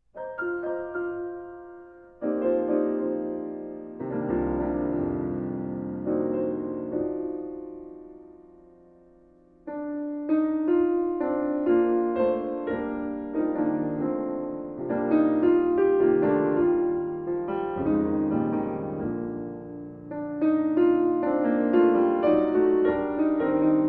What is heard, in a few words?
Solo Piano